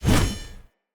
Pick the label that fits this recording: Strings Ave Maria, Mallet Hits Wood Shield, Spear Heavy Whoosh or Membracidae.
Spear Heavy Whoosh